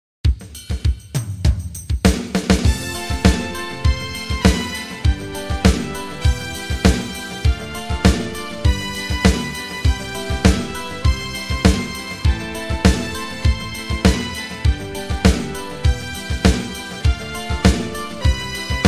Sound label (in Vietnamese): ho la ho ho la he beat, nhac beat